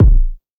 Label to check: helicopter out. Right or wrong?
Wrong